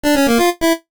jingles NES; Kenney's Sound Pack; Music Jingles

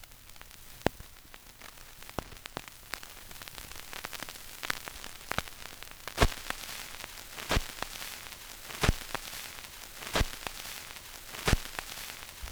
vinyl crackle; 99Sounds; Vinyl Noise SFX

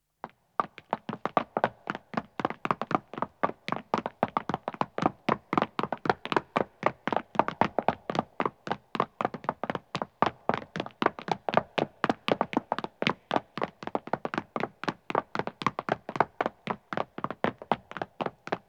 Stone Running, 2 Men Start, Footsteps; BBC Sound Effects Library; Footsteps II

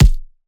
Punching Perc, Straight Glove (Chain); PROCESSED; 99Sounds; Punching Percussion

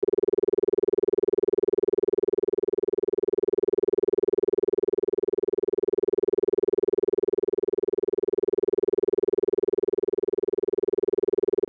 400Hz Isochronic Tone; Mind States

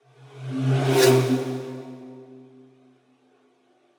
Transition Passby, Scare Tactics; Glitchedtones

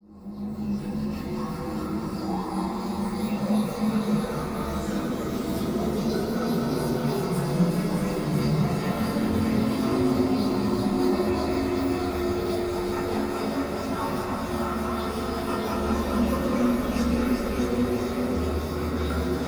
Drone Atmosphere Sci-fi; DRONES; MatiasMacSD; THE ZONE DRONES

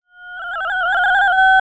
Tech, Weird Side Samples

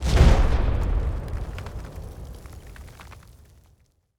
Explosives - Sweetners; SoundMorph; INTERVENTION

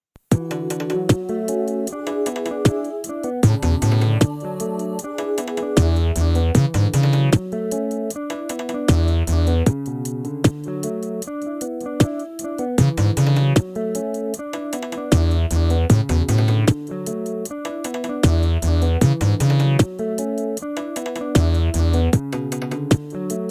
Ragga D Cold Sizzle, Reggae

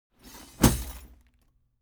ARMOR Body Drop Chain Leather Short; Medieval; SmartSoundFX

